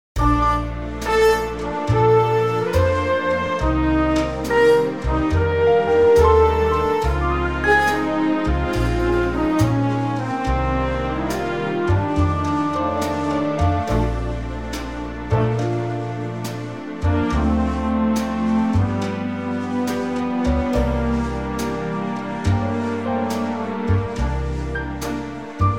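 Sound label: Bb orchestral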